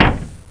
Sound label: foot clunk; Gunlok; Robots